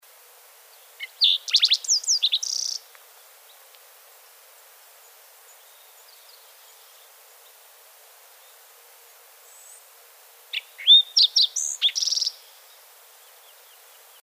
Green tailed towhee; پرنده آدم گریز دم سبز

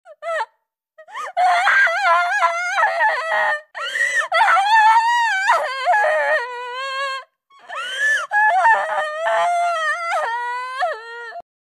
anime cry

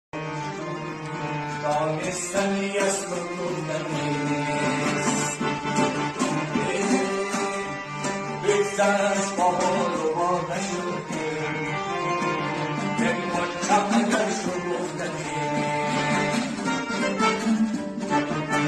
Harmonium

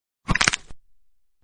back cracking

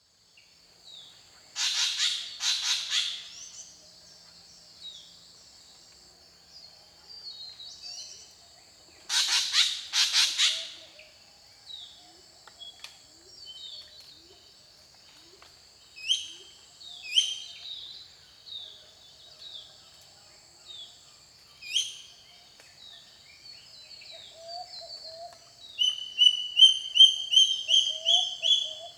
Spangled drongo; پرنده بوجانگای پولکی

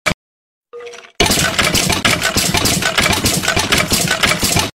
Skeleton; Minecraft; اسکلت در ماینکرافت

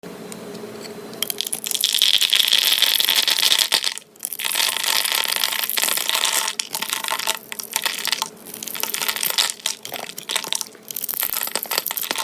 soap asmr; خرد كردن و بریدن صابون

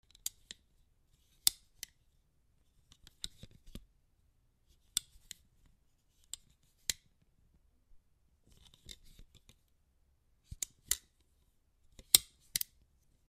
glasses

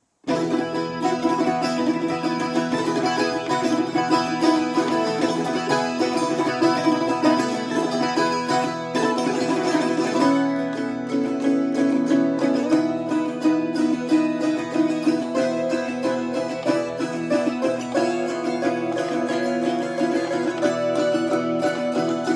Laúd

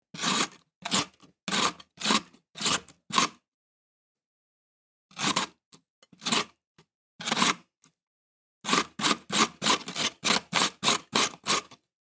Grater Fast Slow Movement

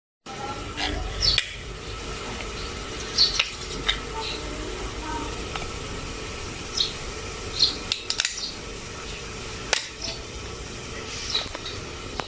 canned, باز کردن کنسرو

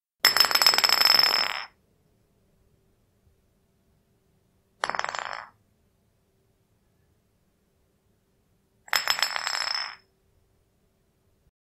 bottle fell

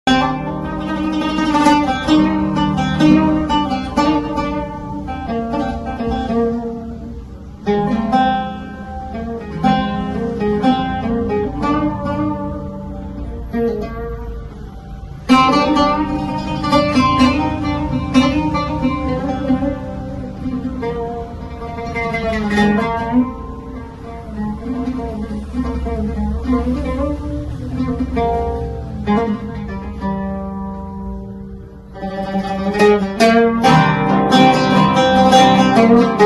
Lute

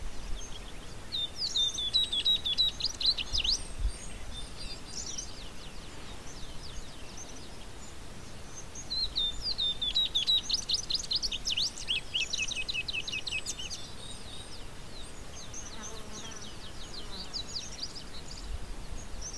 Tropeiro seedeater, پرنده دانه خوار تروپیرو

